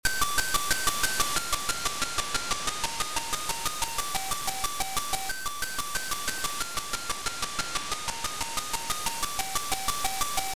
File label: Space Sound